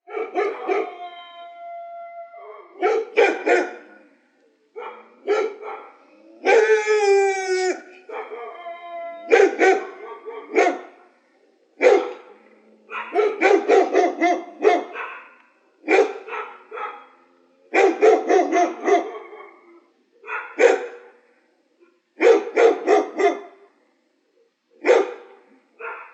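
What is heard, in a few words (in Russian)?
свора собак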